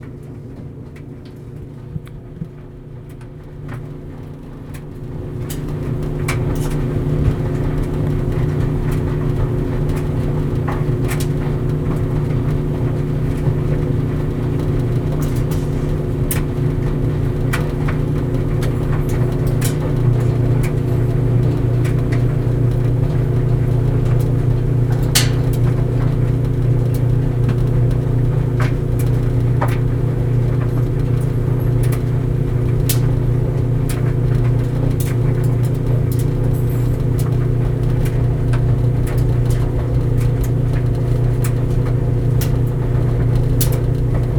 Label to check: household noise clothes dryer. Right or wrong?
Right